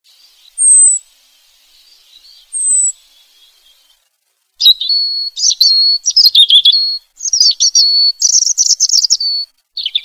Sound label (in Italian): canto pettirosso